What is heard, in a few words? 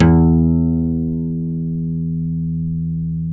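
NYLON E 4, guitar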